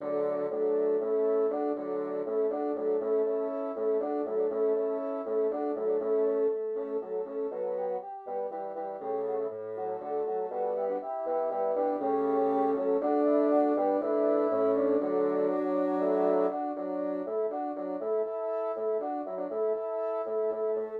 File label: pipe